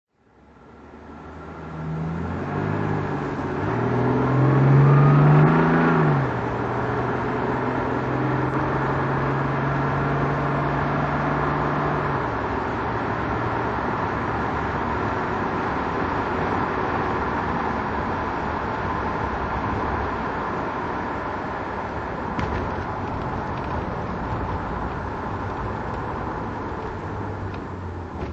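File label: jaguar XJ6 42 automatic driving, Engine sound driving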